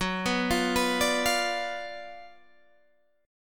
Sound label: F sharp Major 7th Suspended 4th Sharp 5th, F sharp, down, Guitar, Open G